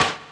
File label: Arrow Miss; An arrow hitting the wall or floor; Link